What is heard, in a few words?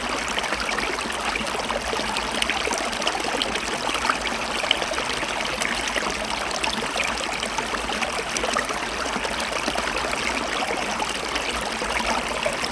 nature water; Earth and Beyond; EA Games